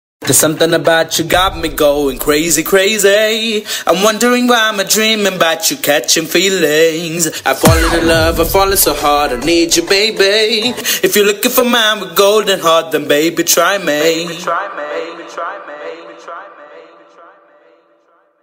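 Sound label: song ringtone background music; skanda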